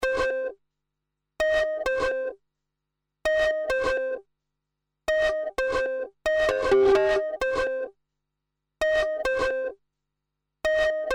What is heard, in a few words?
vibra hook